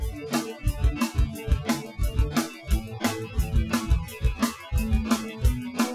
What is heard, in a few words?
Groove